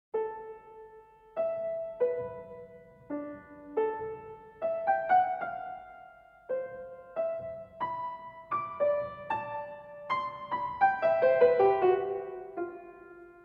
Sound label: Variations for piano; Rostovtsev Danil